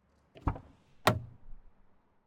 Car Ford Fusion Petrol 1.6 Door Open Aggressive Click; MKH8050 CU; Submerged Tapes; Ford Fusion Plus Ambisonics